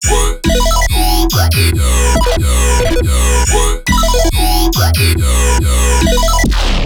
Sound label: Melodic Bass Loop E 140; Loops; Phobos; Ghosthack - Color Bass Essentials